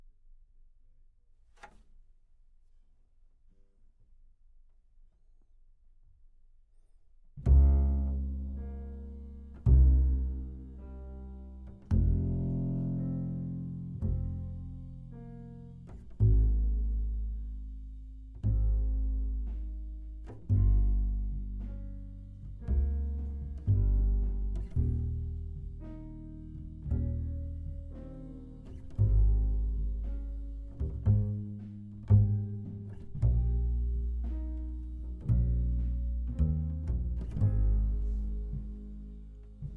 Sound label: Bass